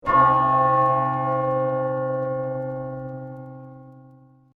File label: Low Low Bell C